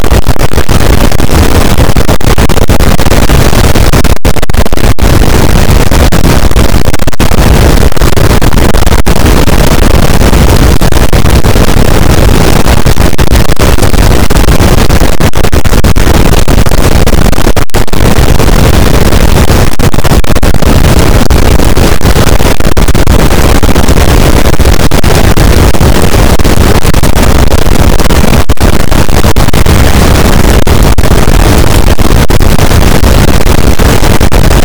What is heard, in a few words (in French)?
cachalot positif, boat; Physeter Macrocephalus